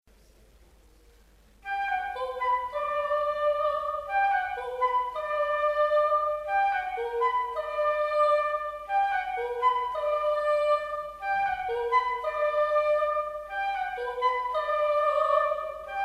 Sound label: flute voice duo